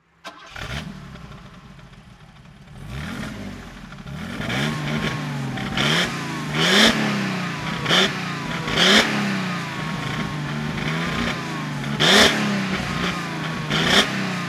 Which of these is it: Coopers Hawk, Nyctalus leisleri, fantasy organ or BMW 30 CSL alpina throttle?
BMW 30 CSL alpina throttle